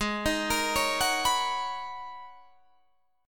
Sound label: G sharp Minor 11th G sharp Guitar DADGAD, chord